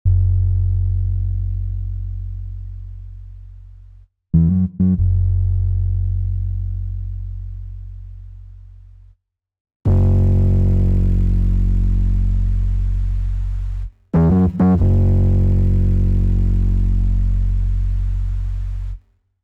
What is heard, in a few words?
bass; phat vst by aldenbraverman